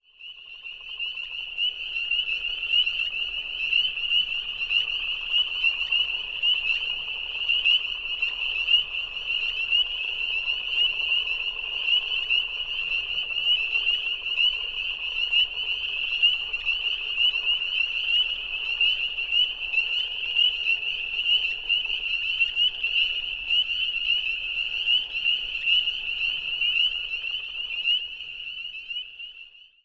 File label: Spring peepers